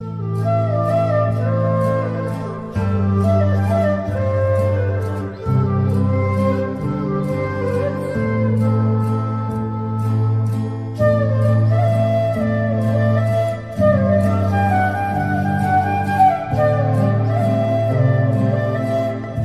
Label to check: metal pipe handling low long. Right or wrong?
Wrong